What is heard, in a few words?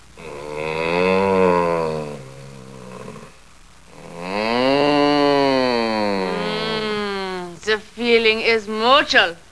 mmmmm